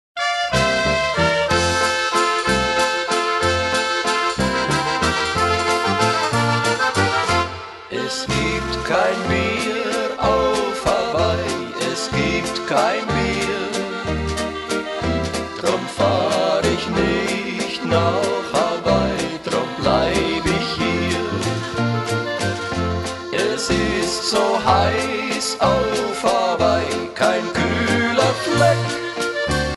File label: Waltz